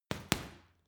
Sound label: boxing punching bag hit double; sport-freizeit; boxen